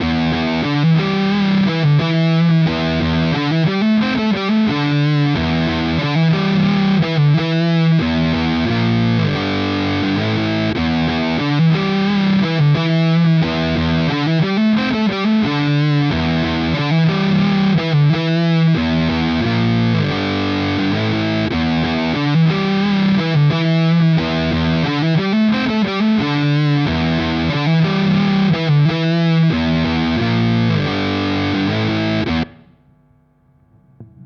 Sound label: Big Muff; Audiovergleich Mooer Nux